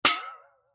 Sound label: Impact Aluminum Can Half Full Flick Hit Warble; Metal